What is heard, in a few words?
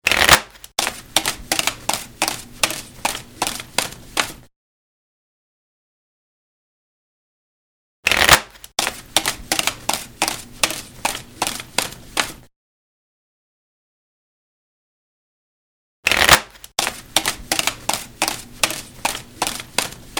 Shuffle Up, ringtones; Las Vegas